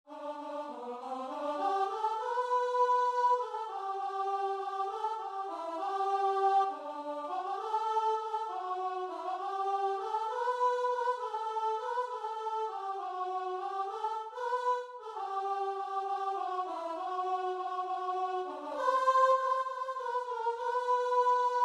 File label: in the garden, guitar and vocal